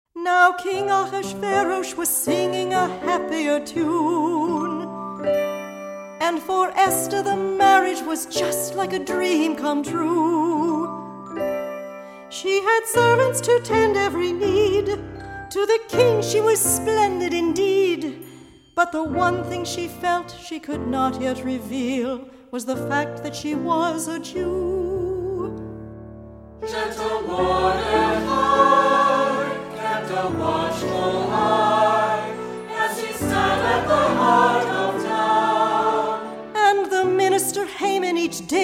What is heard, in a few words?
A Happier Tune